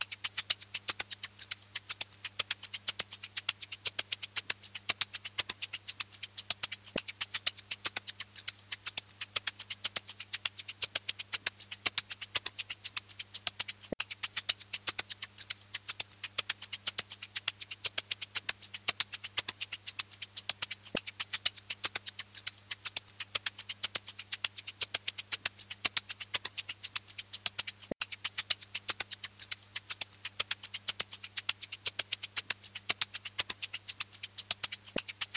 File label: Batucada Shaker